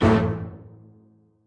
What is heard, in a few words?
orchestra hit melody kit; PADS and STRINGS